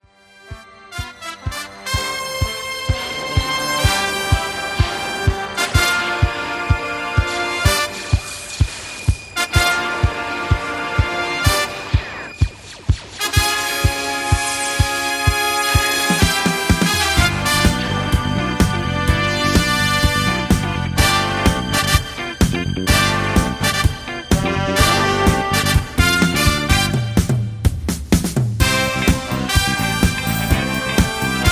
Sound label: Patter